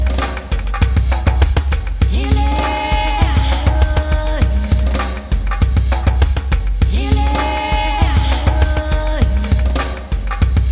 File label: loop